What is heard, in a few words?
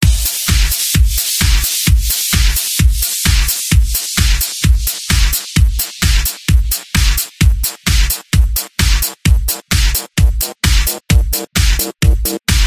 Rave Bells Et Electro Remix